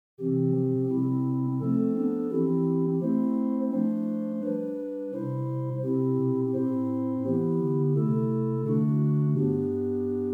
Sw Rohrflute8, organstops; First Baptist Riverside

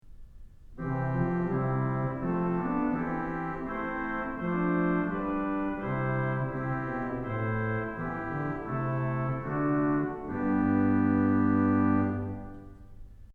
Tromba, organ stops; Kellogg Auditorium

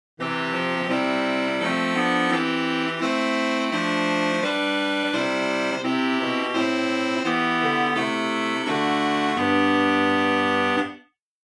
Krummhorn 8, organ; Buzard Opus 3

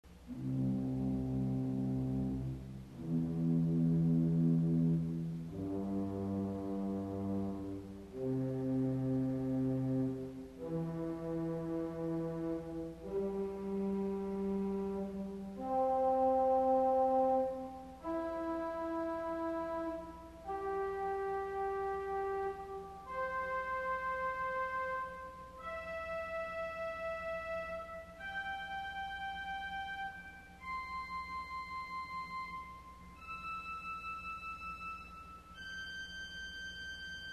Viola Celeste Arpeg, organ stops; CulverAcademy

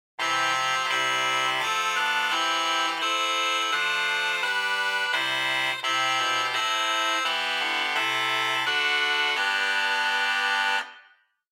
Bw Regal8; Grace Lutheran Champaign

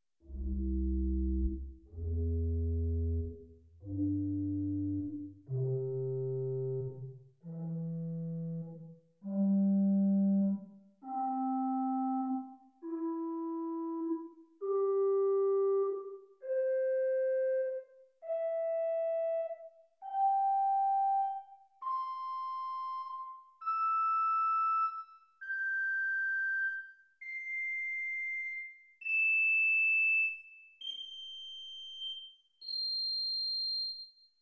Tibia, organ; Paramount Middletown

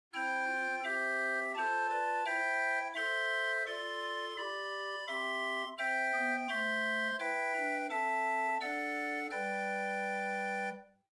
Rohrflote 4, chamber organ

